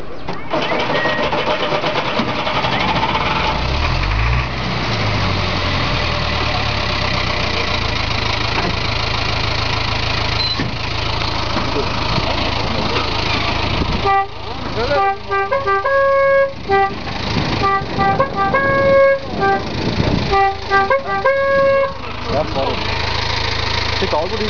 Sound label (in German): Hanomag Anlasser